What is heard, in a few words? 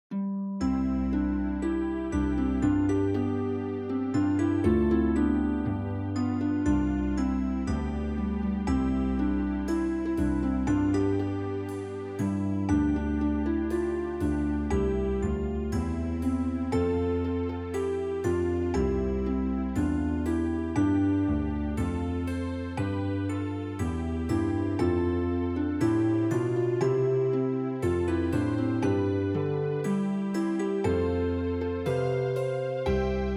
instrumental